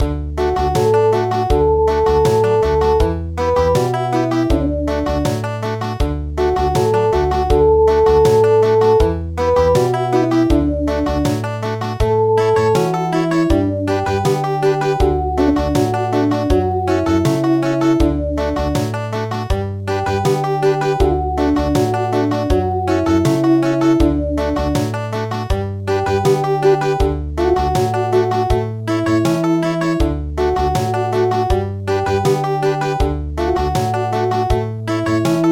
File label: Country